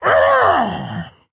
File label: Male Orc Death